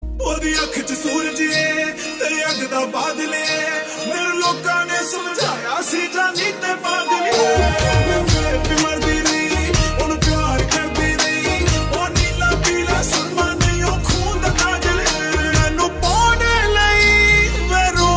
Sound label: Male Version